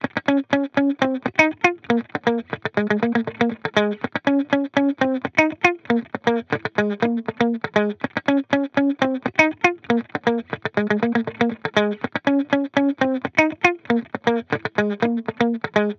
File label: guitar loop 120 Bbm; Pop; Guitar Parts; SD PASADENA Pop Sample Pack; Slate